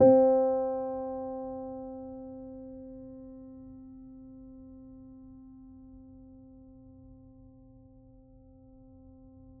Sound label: Clean Piano C3; Aubit; ODESSA Vol. 3 Noises